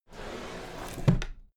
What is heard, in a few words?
drawer cabinet equipment close regular